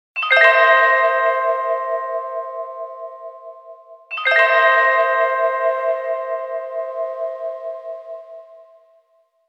Vibratone, ringtones; Motorola Backflip

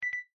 camera focus, ui; Asus Zenfone-10

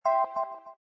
E Piano Off

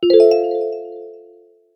Bells Gleamed, notifications; Alcatel Pixi 3

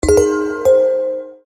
Announcement; PlusFourSix; Sania; Lars Edvall

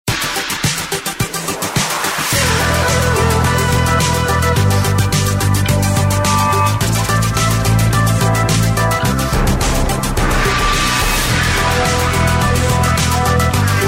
Digital cloud, Samsung phonetones; GT-C3528

